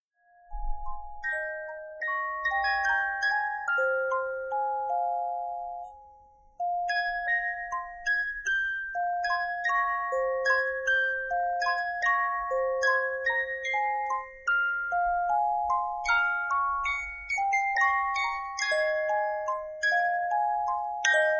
scifi

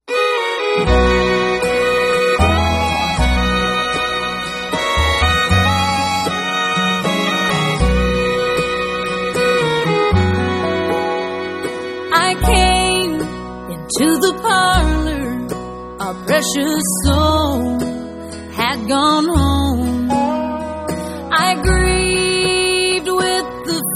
bluegrass

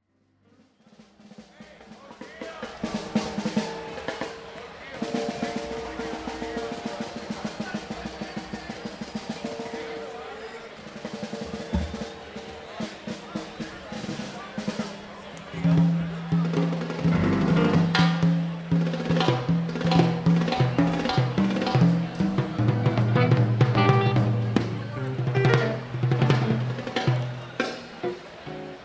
jazz

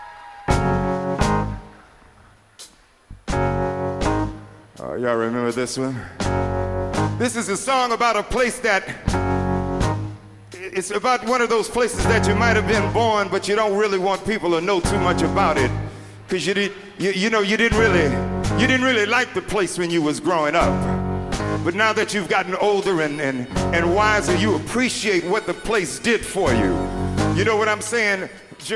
jazz blues

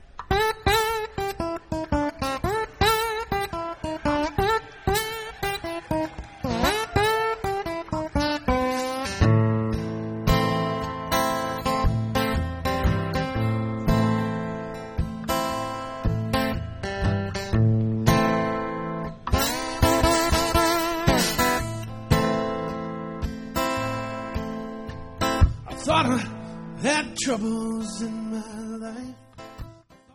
jazz

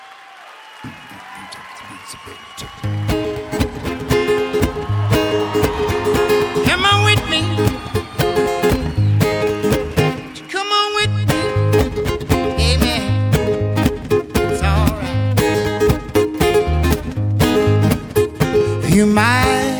solo

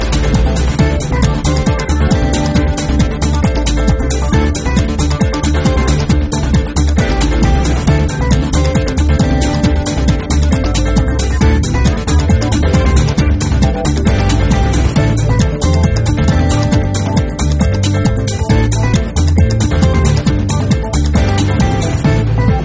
string

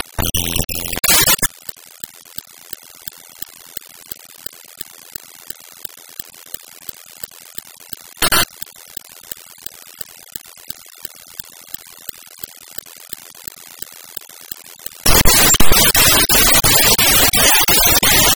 thunder

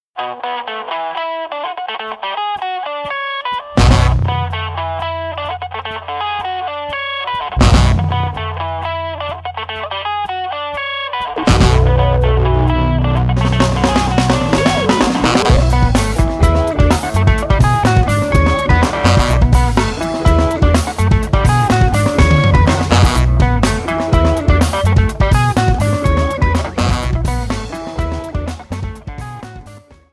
creep funk

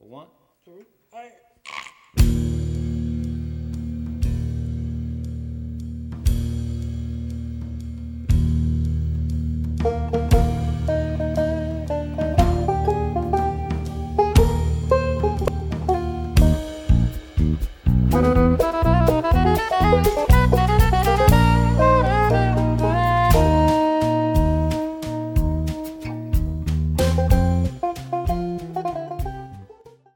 jingle

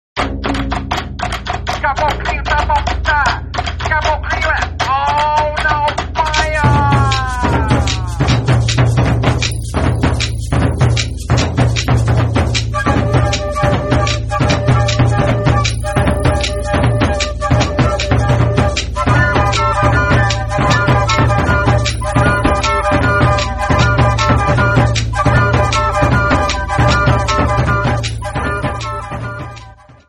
donkey beat

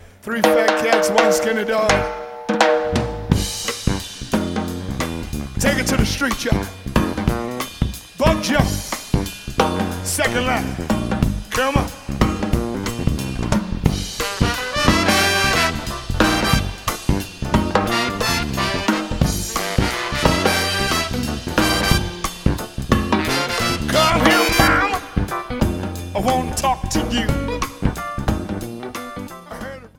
jazz blues

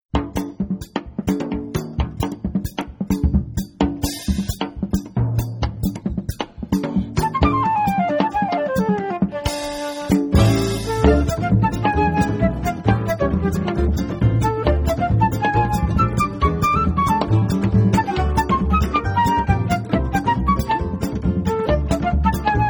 groove

